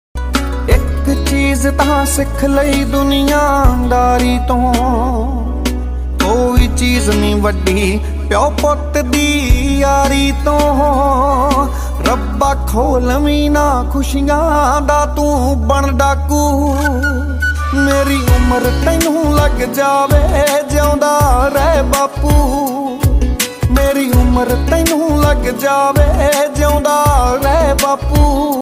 punjabi song ringtone

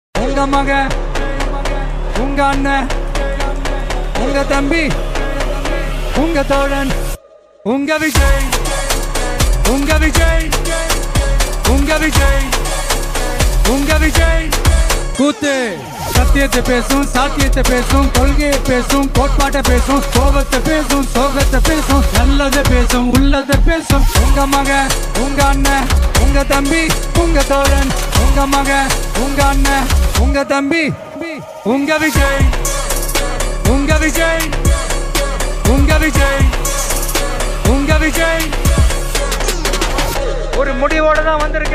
vox bgm ringtone; tvk thalapathy; vijay

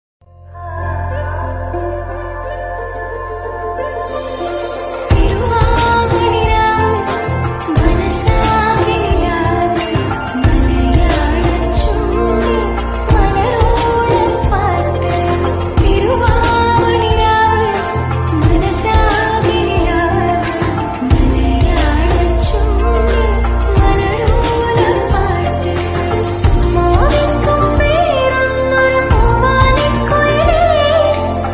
onam song malayalam ringtone; happy onam

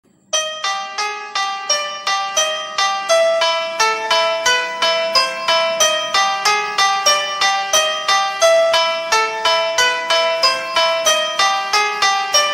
bgm piano ringtone; mouna ragam

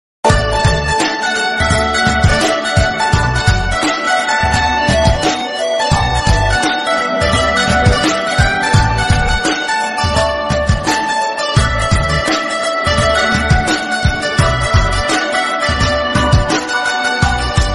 love bgm ringtone; ye maaya chesave

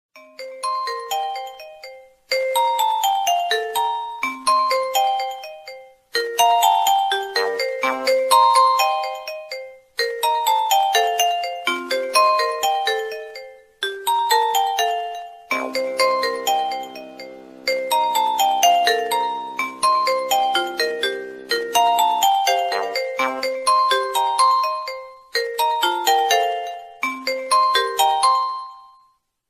nokia brook ringtone

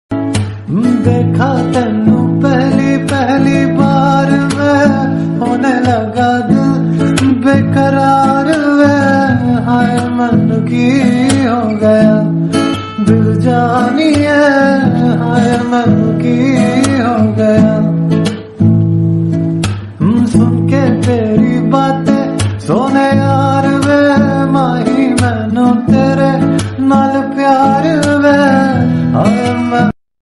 ringtone hindi ringtone

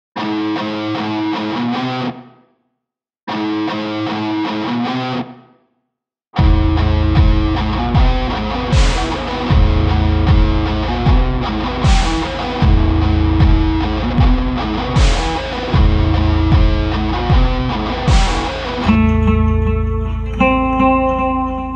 guitar cover; kabir singh; mass bgm theme

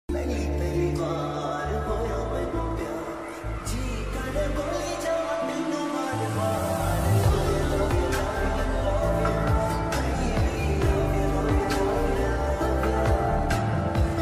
ringtone punjabi ringtone

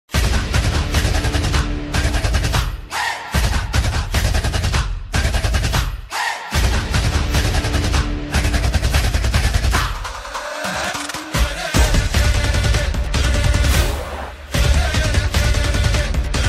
attitude entry bgm, Malayalam ringtone; tovino thomas

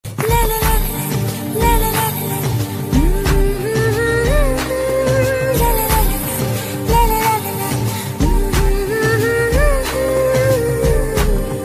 song bgm love ringtone tamil

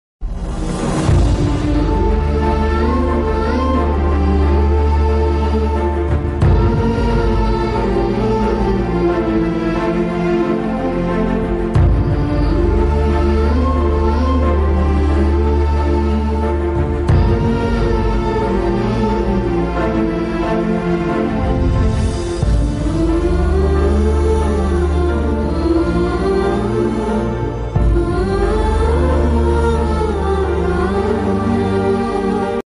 romantic south movie short ringtone; yevadu; ram charan; shruti hassan

